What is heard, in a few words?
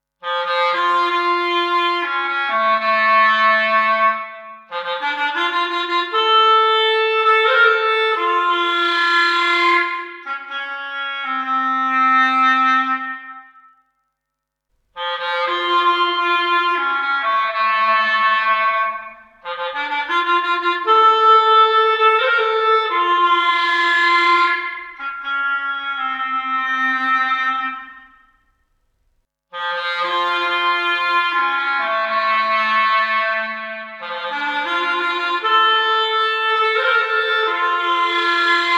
clarinet; logidy epsi; ursa major stargate 323